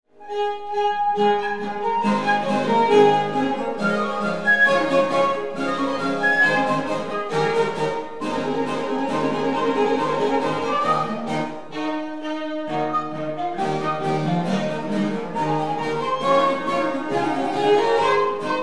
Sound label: tambourins